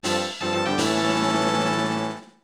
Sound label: Small Item Catch; Nintendo 64; Legend of Zelda, The - Ocarina of Time